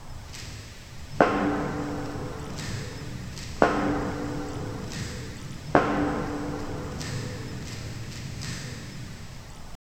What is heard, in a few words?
soft ceremonial throne ha